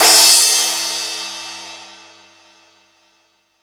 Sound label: Ambient Rock, Drum Machines